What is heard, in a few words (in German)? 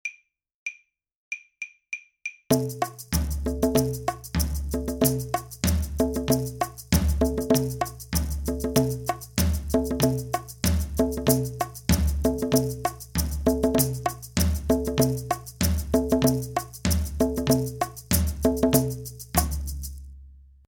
die affen rasen durch den wald perc